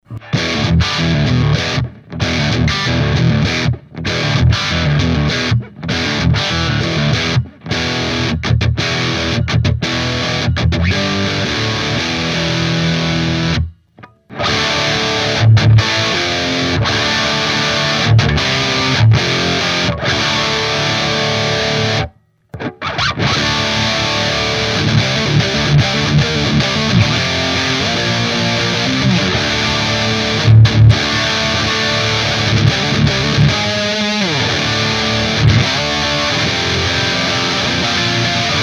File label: diezel vh4